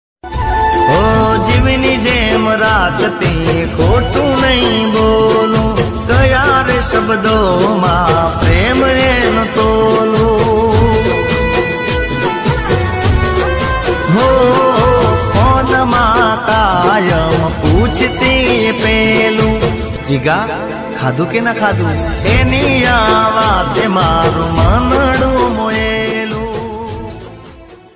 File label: gujarati ringtone